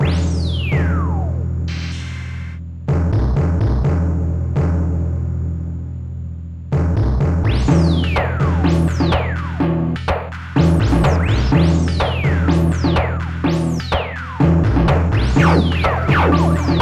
laser beams; MusicLine

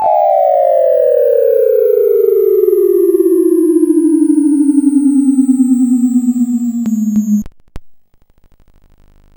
teleport; zak; SCUMM

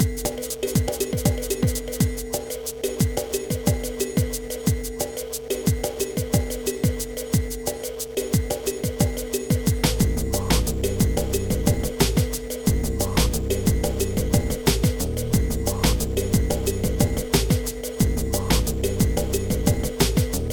rhythmking 120bpm; Trackers Modland

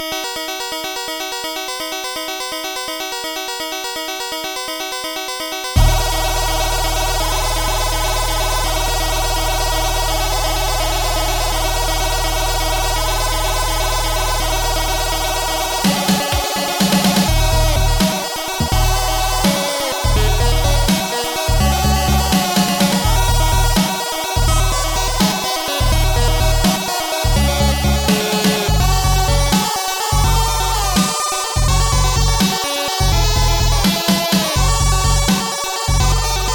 death game over, mod; Trackers; Modland; Legend

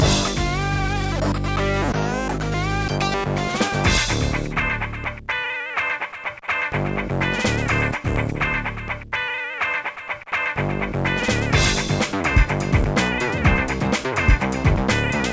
jazz; Trackers; Modland; yello'jazz

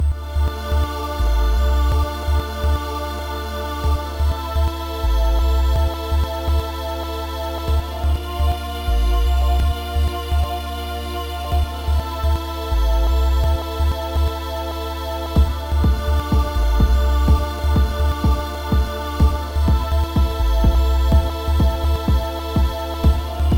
lowbass

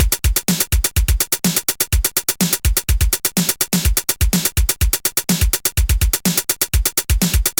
loader; pinball magic; SoundFX 1.3

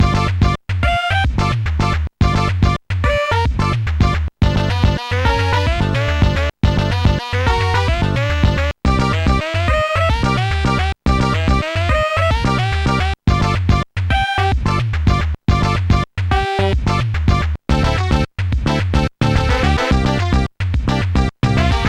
funky blues; Instant Music

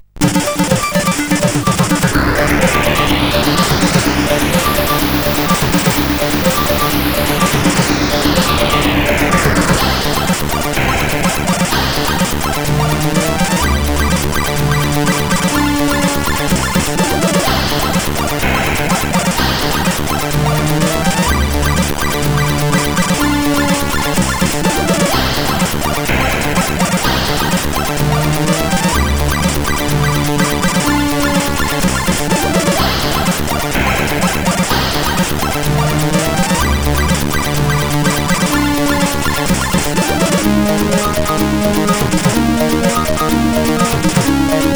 Sound Demo; Hartwig Stefan; Sound Demo 1

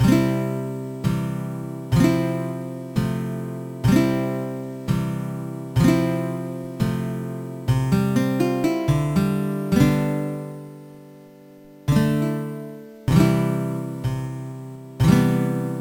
guitar theme; Trackers; Modland; Mush

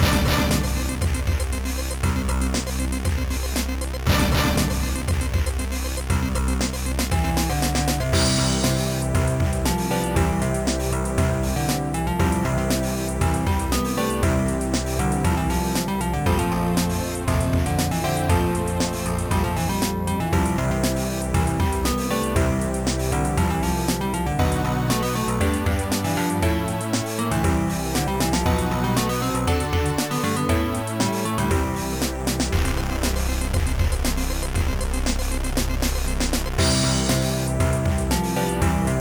Dream guitar; MusicMaker V8

